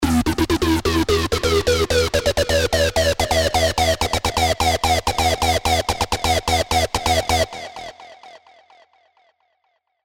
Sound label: Acid Loop E (128 BPM); Spire; Junk Food; ACIDVSLASERS1